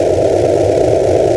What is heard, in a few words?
fly idle; baseq2